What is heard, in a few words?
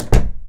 Door Close